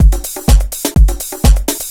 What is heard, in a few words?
HOUSE BEATS 125